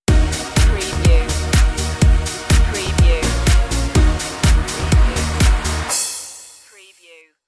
ibiza feeling stinger

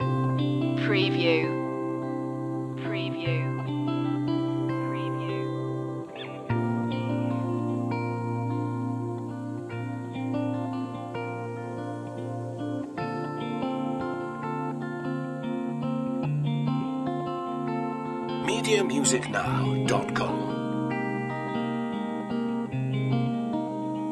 guitar stories loop

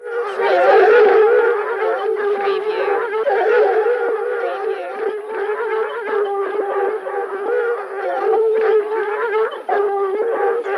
Dogs Hounds Pack Barking

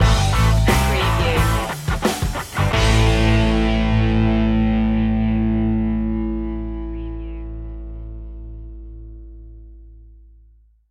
total trucker stinger

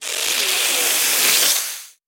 Monster Small Animal

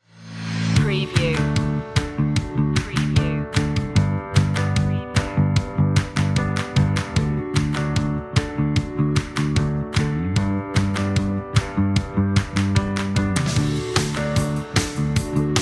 inspiring no guitars

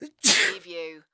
Sneeze Male; sound effects bible

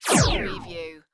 Laser Gun Single Shot; Sound Effects Bible

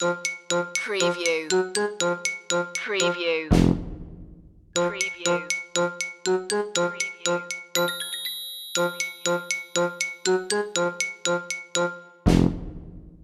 ducky loop full

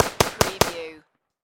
Machine Gun AK-47

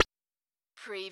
splat

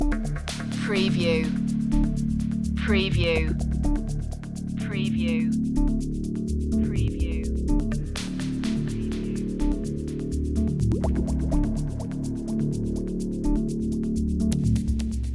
beats loop, chill

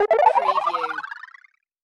Ascend Digital; sound effects bible

